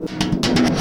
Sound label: BIG TIN, rhythm loops; Rarefaction - Poke in The Ear With A Sharp Stick